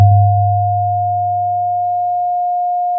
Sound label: pop, Sound of a church bell